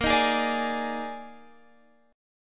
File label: Eb Major 7th Suspended 4th Bb